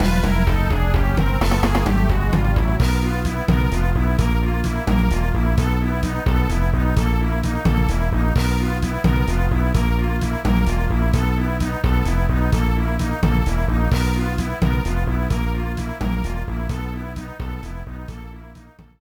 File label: Lose Match; mario tennis power tour